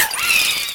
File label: Fan Chant; Mario Paint